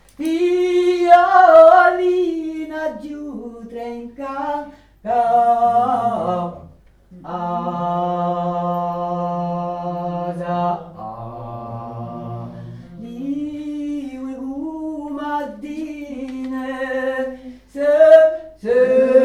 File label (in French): BASSE; U Versu; Uversu di mocu; VOIX